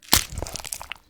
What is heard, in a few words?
sound Death Fall